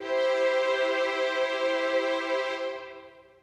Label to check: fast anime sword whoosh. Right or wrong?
Wrong